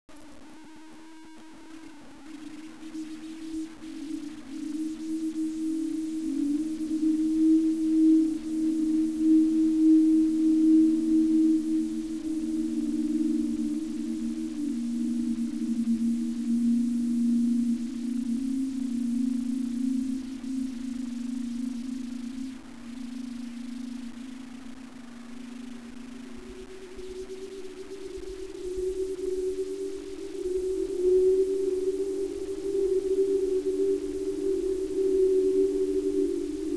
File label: whistle, ambience